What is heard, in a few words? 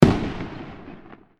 burst, firework